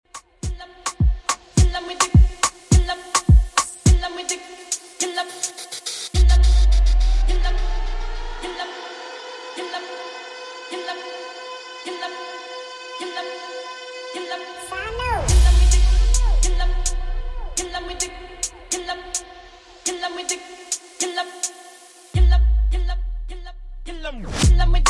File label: Moombahton Redrum 105bpm CLEAN